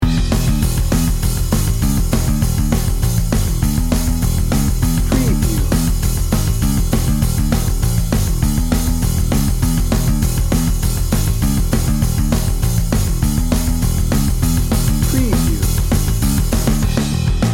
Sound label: power source